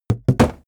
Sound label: box cardboard put down; objekte